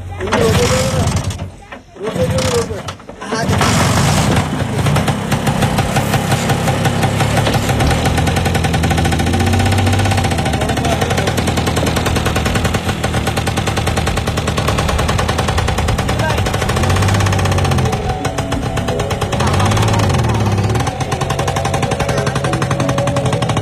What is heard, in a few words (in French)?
Voix d'ailleurs musique